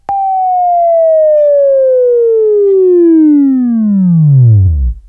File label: Buzz, Left; Labview-Program; Sweeping Training program